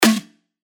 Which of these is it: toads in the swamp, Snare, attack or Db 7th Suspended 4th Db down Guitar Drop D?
Snare